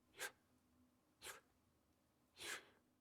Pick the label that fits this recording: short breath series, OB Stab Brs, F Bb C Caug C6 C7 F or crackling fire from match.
short breath series